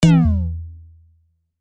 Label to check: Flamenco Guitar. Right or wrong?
Wrong